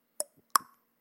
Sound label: message system; livechat